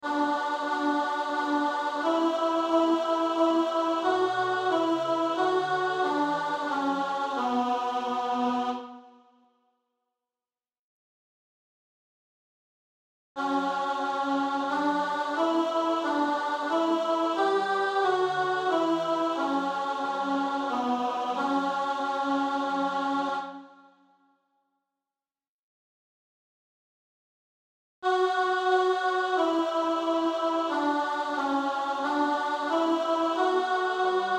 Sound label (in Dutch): tenor; Motetten; Ave Regina Caelorum